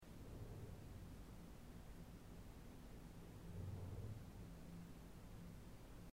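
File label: isu, chair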